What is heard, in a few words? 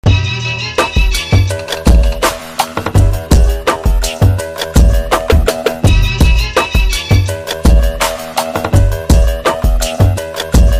bgm ringtone; por movie; mass bgm